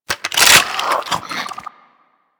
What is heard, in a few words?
Swarm Rifle Reload; Warframe; Infested; Shock Swarm Rifle